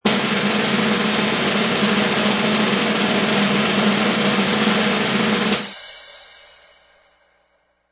Snare Roll Cymbal Loop 5 Seconds; Bumpers

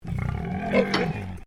Animal Pig Landrace Inside Grumble; Edgar; Edgar's Mission Farm Victoria; Pigs; Animals

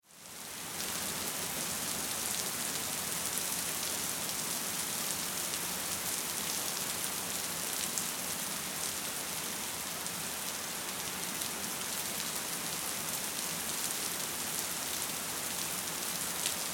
Weather Rain Pour Light Splatter Ambience

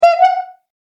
Vintage Bicycle Horn; Horn Sound Effects

